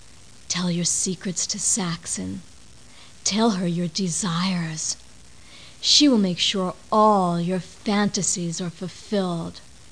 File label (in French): saxo